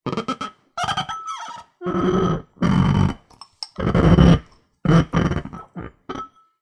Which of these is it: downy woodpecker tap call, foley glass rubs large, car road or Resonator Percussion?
foley glass rubs large